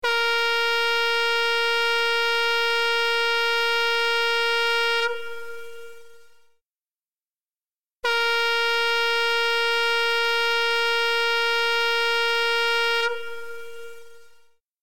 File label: all clear